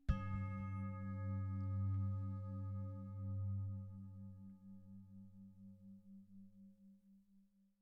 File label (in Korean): 싱잉볼 사운드